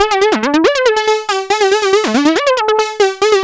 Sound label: ACID LINE; ACID LN